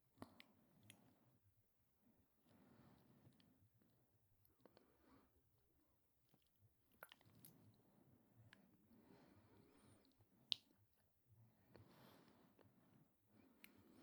キス

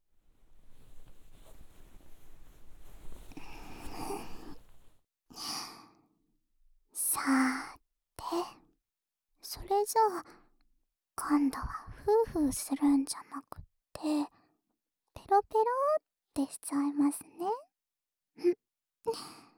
右耳の耳舐め, ASMR; ふかーいところ、ぺろぺろしてあげます

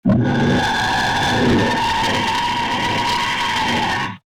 恐ろしいノイズ

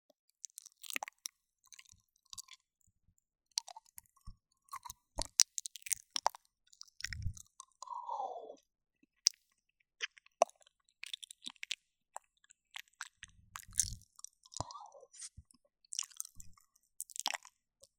耳舐め オンリー右

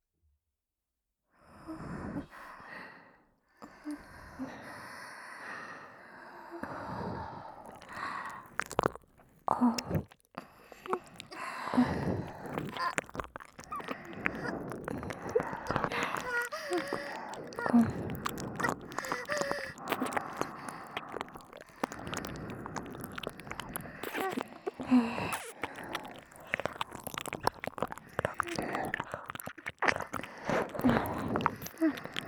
喘ぎ：両耳舐め; 中央＞みさき

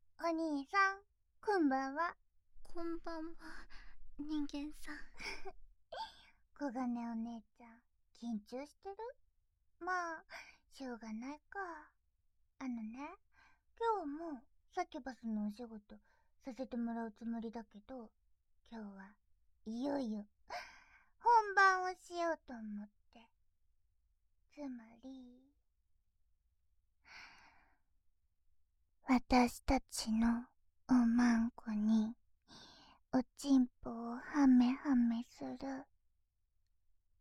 本番えっち ボイスのみ; 姉妹で本番えっち★

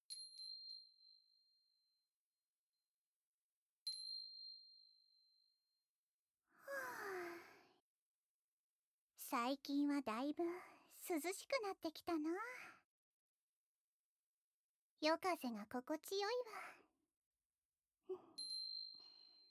耳舐め おねだり 射精音なし

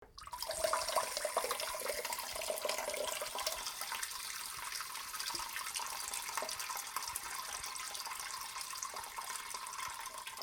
しょーぼちょちょおしっこ, ASMR

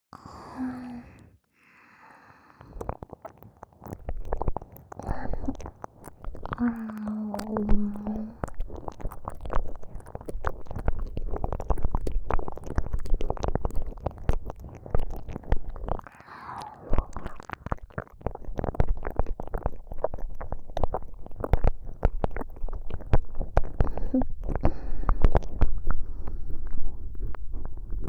かき混ぜるような 耳舐め; 鈴姉; (射精あり)

